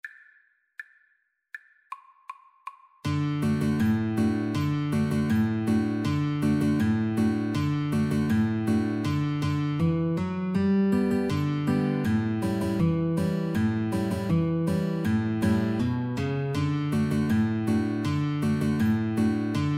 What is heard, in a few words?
wabash, Guitar Duet